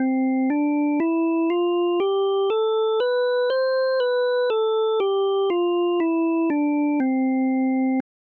c major scale click harmonic imperfection wheel imperfection, hammond